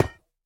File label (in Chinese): 下界合金破坏声